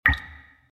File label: beep